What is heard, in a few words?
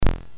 BASIC sid MOS6581R4, GAMES; Sword of Fargoal